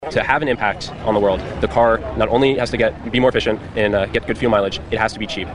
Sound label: impact